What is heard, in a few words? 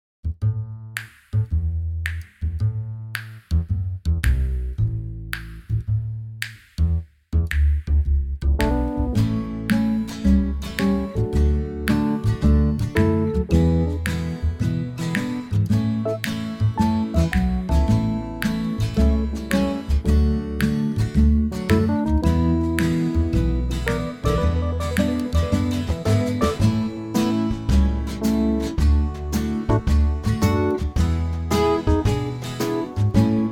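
A to Bb, Backing Tracks for Male Vocals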